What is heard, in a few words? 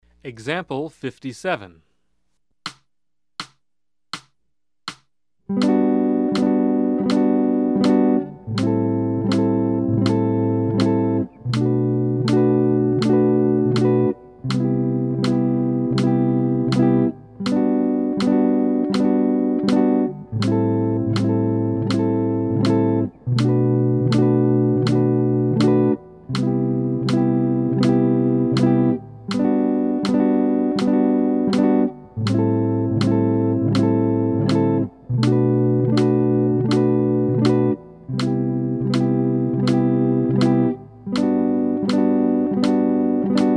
Jazz